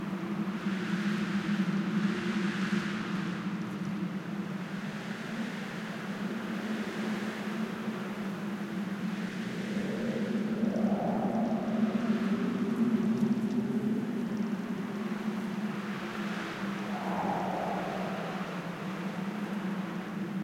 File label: Ambiance - Snow